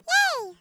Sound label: yay